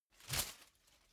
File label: whoosh paper scrap flutter